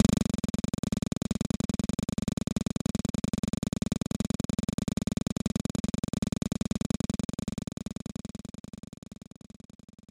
Drumroll; Goal Super Goal; SNES

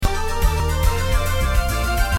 Victory Fanfare Intro

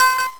SFX Pause; life is a game android

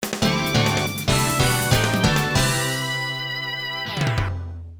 Jingle of Goal; Alpine Racer; arcade

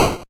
Shoot; This Is the Police

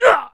Human Male Hurt Short

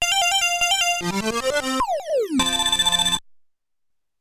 Game Over Music; Pac-Land; Arcade

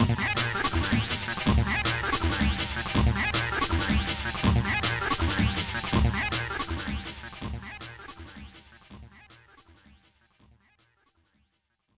Memory Pack Error

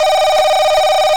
Calling; life is a game android

